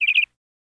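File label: Meadow Lark Happy Chirp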